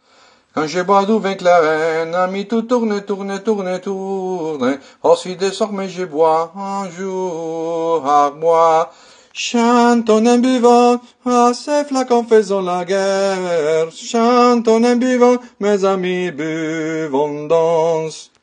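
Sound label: Tourdion soprano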